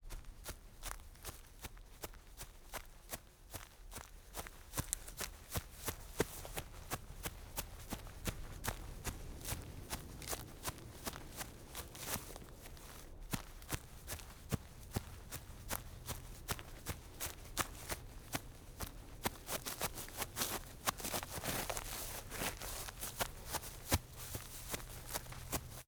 Running Slow in Grass Constant Cleat Impacts, Foley Footsteps; Foley Essentials